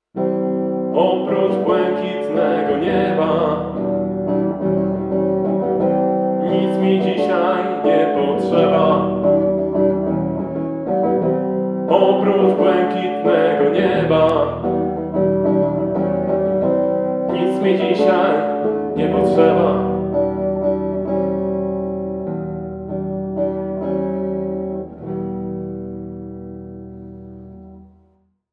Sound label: duet